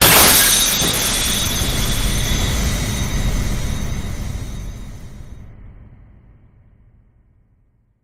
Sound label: breakdown; dgs-niko